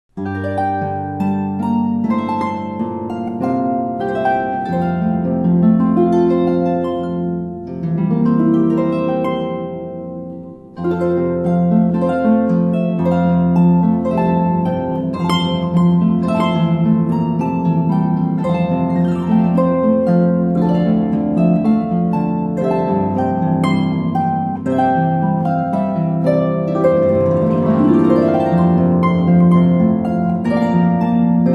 hark the herald angels sing, christmas carols on the harp